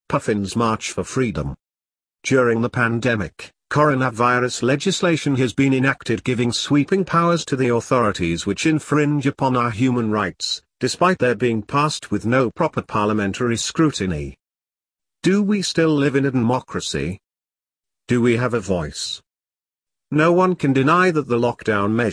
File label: march